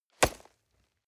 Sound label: nylon leather hit